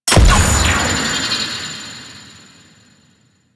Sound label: Medusa Button Activate; Sentient; Warframe; The New War; Sentient Devourer